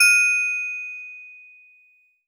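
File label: Steel Drums DX100 D#6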